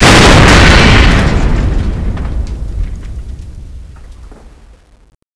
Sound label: elevator crash; zs rendezvous